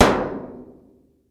metal duct hit

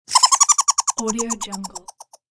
happy chipmunk

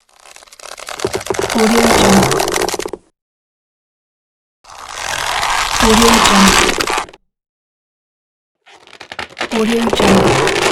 rubber stretch

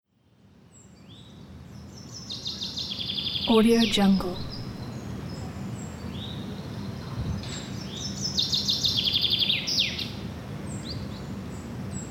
june park atmosphere; ambientsfx

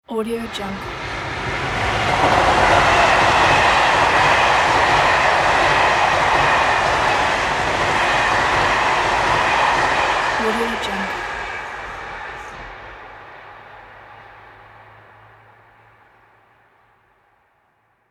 high speed train

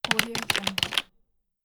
mechanical keyboard key multiple

